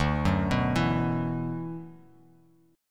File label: D 6th Add 9th D; D6add9 arpeggiated; 10,9,9,9; JGuitar